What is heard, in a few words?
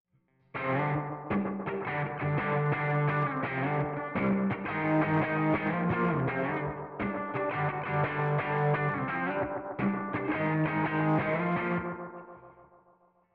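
guitar delay return solo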